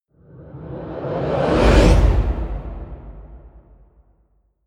promo pad

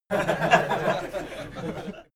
laugh male short

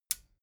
metal button stereo click power on

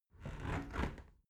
window close soft

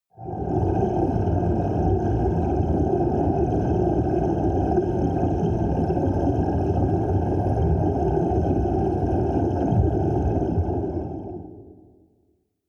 water fx dark

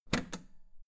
old button heavy hard push

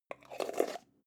jar plastic midsize close slow

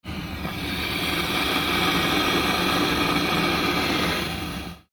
airplane toilet washbasins

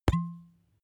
glass bottle plop

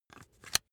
razor rickety fold up

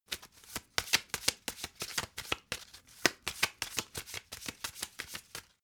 playing cards shuffle regular

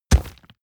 horse step dirt

